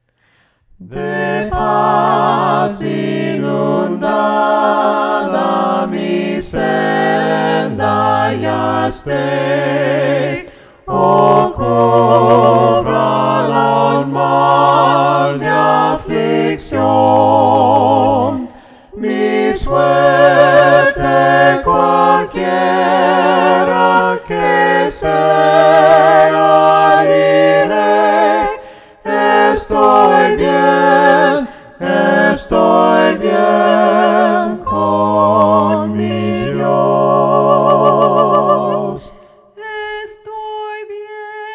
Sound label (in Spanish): VOCES; ESTOY BIEN CON MI DIOS